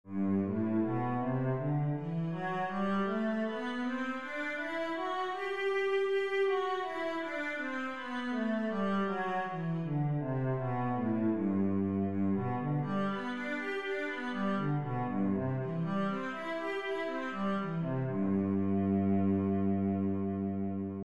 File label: g major, cello